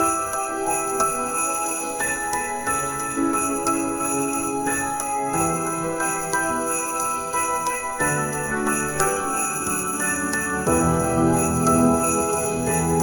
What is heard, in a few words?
soft piano jingle bells snow bells 90 bpm